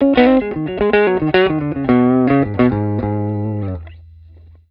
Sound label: LP NECK LICK, humbucker pickup; patent denied humbucker pickup